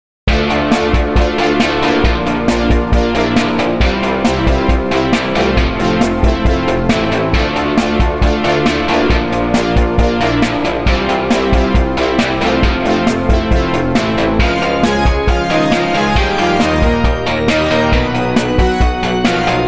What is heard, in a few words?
e guitar song